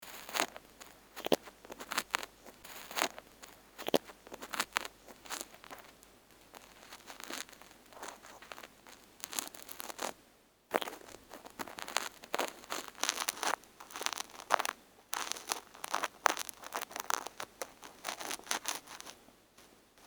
耳かきR（耳鍼モデル＋塩＋耳かき）; 夏実の耳かき

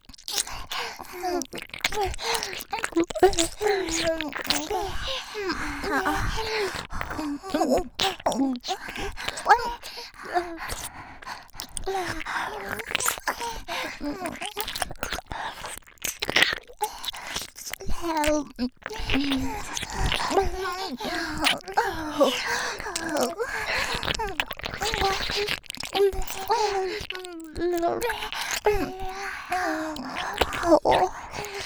耳舐め激, ASMR

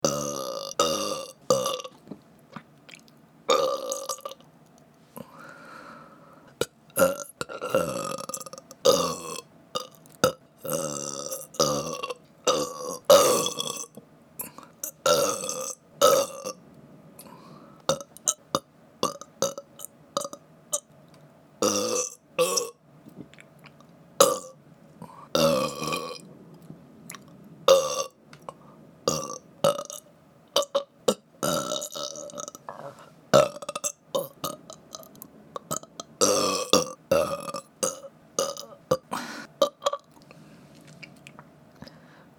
ゲップまとめ

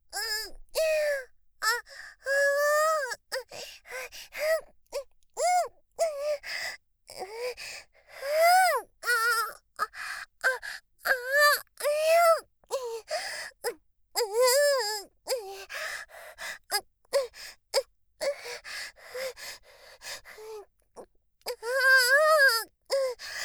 喘ぎ 絶頂ふやふや感; ありすちゃん（リスみみの地下アイドル＋コンビニバイトAさん）

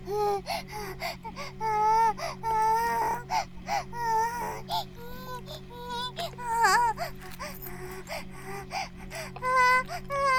バイブ放置 おしっこ

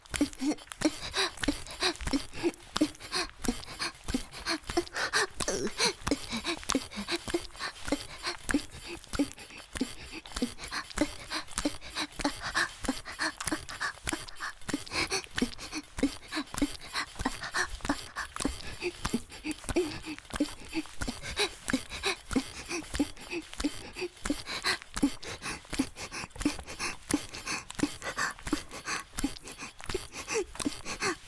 ギシアンループ, 雨降りバージョン; 洋館のクールな美人メイドと自己犠牲愛

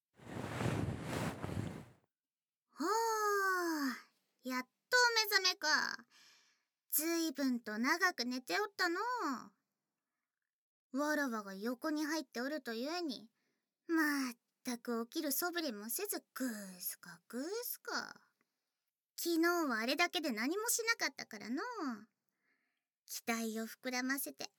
手淫耳舐め, ASMR

